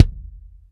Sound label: BD, Bassdrums, Roland TD-20